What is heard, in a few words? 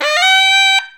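SHENAI, Shenai (Vib)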